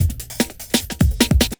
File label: LOOP, Drum & Bass, 150 BPM